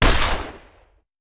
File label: Hand Gun; Sound Ideas; Guns